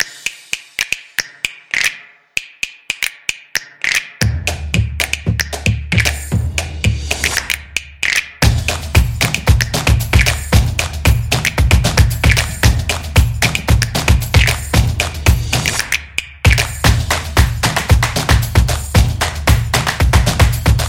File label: Promo Drums